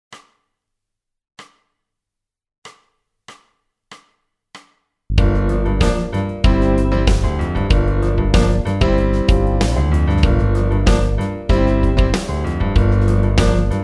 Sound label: Funk n Soul Song, piano